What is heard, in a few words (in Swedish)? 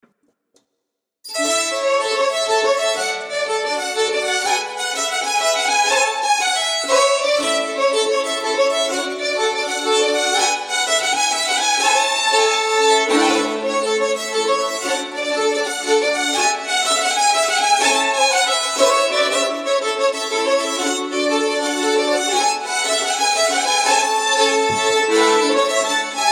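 Polska, säckpipa; efter Karl Nilsson OL Persa i Bovika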